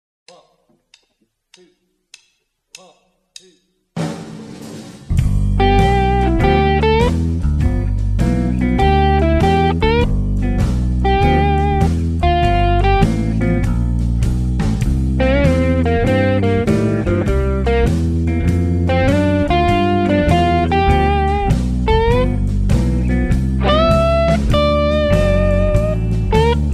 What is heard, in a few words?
Easy Blues Solo Slow, blues guitar